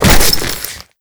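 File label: destruction luggage burst; Explosions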